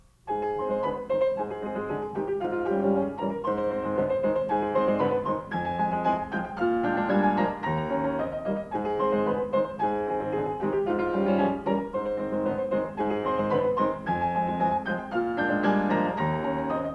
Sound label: Carnaval for piano; Schumann; Op9